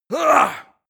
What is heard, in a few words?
male fight; schreie; menschen; soundpool